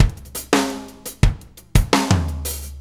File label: Drum Tools, Loops; No Small Feat; Sonic Foundry (Sony Creative Software); Various Loops